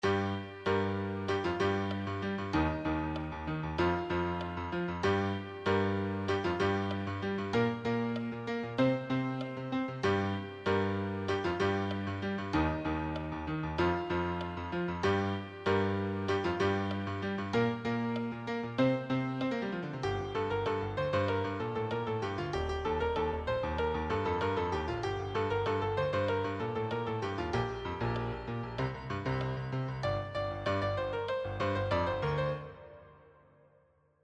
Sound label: advanced solo, piano